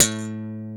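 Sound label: guitar, funk guitar, Strat, clean electric guitar; Brite Strat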